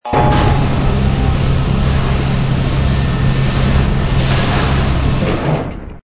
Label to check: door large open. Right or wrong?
Right